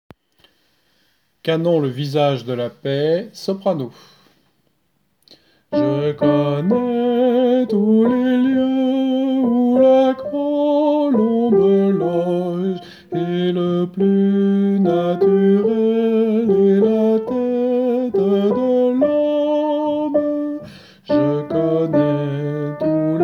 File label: canon soprano